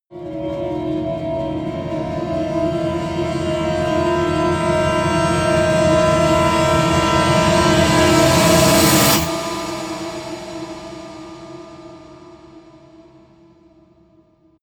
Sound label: demon